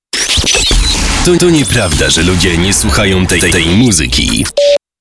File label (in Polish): JINGIEL